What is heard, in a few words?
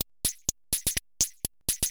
PERCLOOP HOUSE 125; eJay